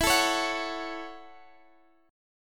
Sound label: F sharp Minor E, Chord; strummed; JGuitar